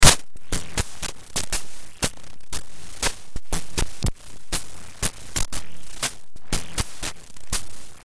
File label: fm7 patches